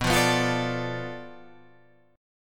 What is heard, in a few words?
B Minor 7th Flat 5th B Guitar Half Step Down; Bm7b5